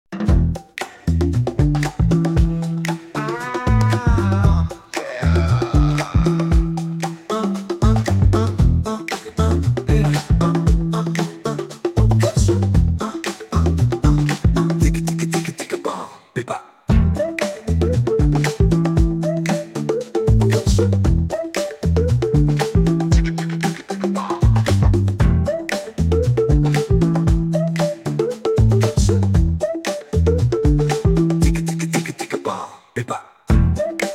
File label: Funky